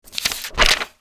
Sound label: flip